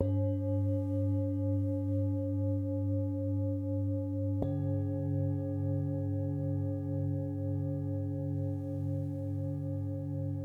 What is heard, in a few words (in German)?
klangschalen unbearbeitet rode nt usb plus